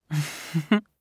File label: laugh, JPN; Eternal Return